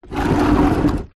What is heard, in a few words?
foley plastic pot tub move scrape concrete ground